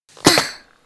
女跳跃落地

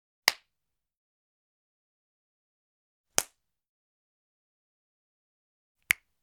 拍打

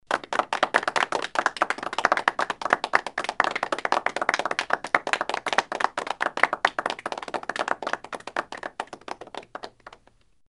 稀稀拉拉的掌声

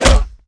女被拳击中; renlei

